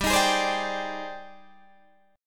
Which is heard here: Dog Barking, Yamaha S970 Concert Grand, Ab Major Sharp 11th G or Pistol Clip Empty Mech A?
Ab Major Sharp 11th G